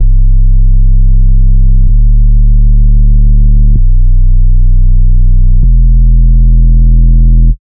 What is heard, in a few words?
Bass; Singularity Rift; Routed Sound; Vital Expansion - Star Scavenger Vol.1